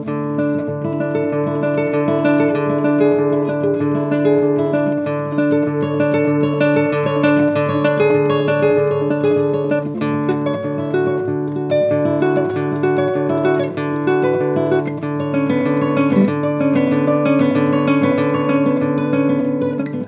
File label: classical guitar